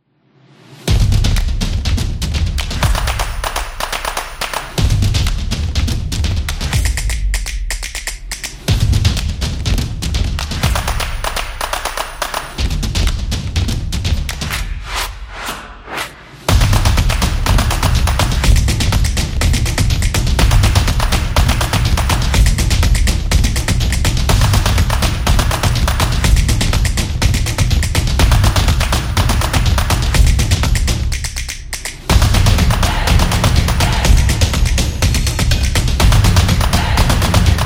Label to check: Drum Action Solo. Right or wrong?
Right